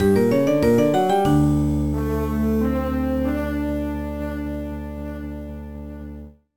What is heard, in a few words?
Fanfare